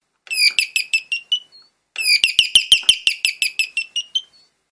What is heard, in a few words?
nightingale sound